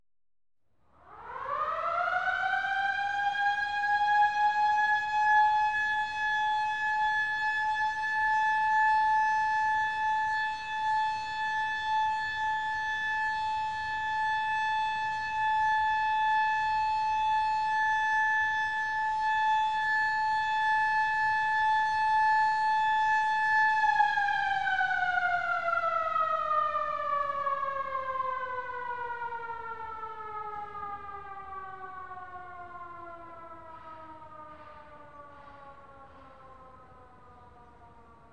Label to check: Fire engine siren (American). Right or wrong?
Right